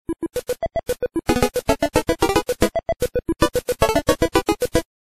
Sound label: Clay Shoot